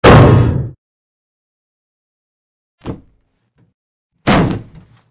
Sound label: drop big weight